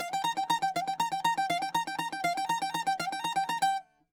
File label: bouzouki